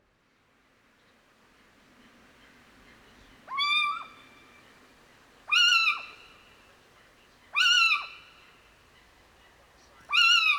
Little Owl Athene noctua

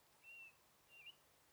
Bird

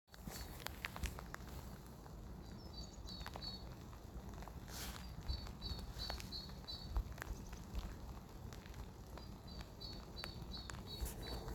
Worcestershire unknown bird

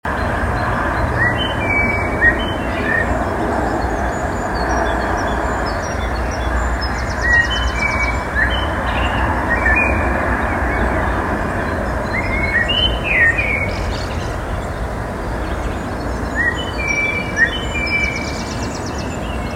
Blackbird

